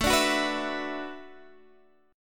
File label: E 7th Suspended 2nd Suspended 4th, A